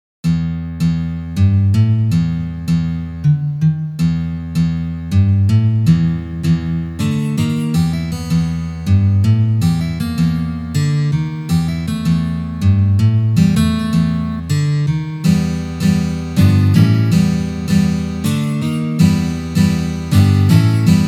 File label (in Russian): na gitare